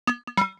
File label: Alert; Samsung; SGH-i310